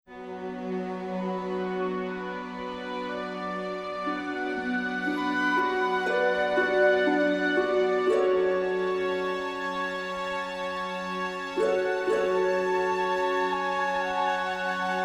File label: The Weeping Violin